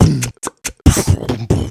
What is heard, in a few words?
Beatbox Loops, Human Beatbox